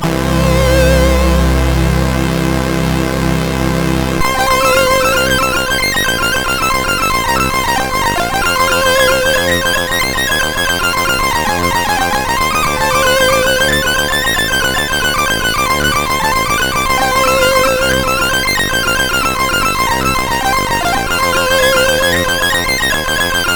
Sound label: E-Load loader, CSG8580R5; hvsc